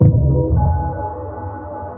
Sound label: sonar Ping Air Medium Shuttle Directional; Barotrauma; Real Sonar